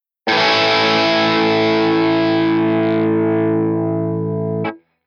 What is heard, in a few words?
distortion overdrive